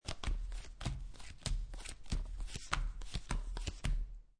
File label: Playing Cards Dealing Cards On Felt; Sound Effects:Casinos